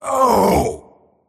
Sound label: pain; dota; tiny